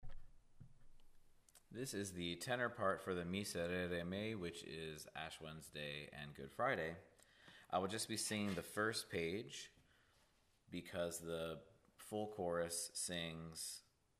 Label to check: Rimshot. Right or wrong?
Wrong